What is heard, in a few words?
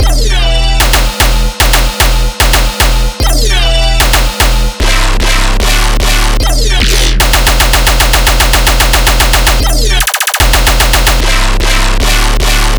Bass Loop E min 150; radiance; TearedForth